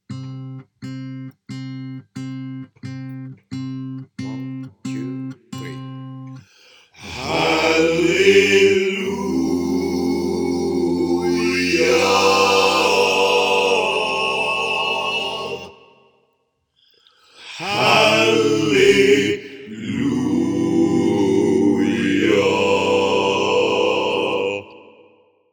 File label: CHOIR PART TUTTI